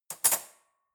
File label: cutlery fork drawer handle place